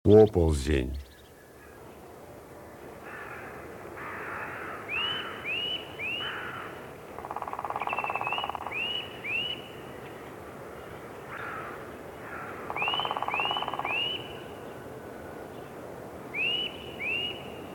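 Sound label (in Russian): popolzen, голоса птиц; Поползень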